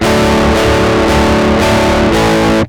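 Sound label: rock chords; A15Mk2; IDMT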